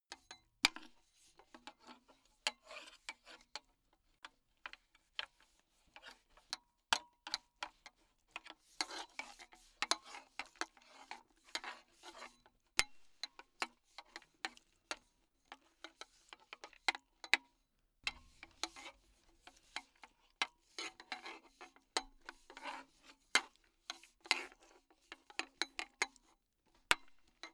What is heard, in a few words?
metal pipe handling low long